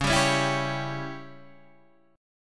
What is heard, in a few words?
Db Diminished Db down Guitar Open D